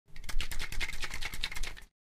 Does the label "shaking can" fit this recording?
Yes